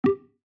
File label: diff line inserted